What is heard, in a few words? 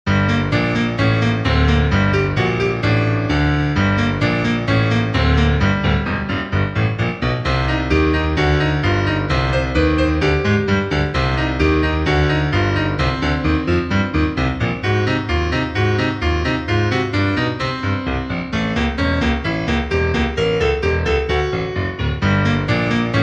Library Piano; Luigi's Mansion Dark Moon (3DS)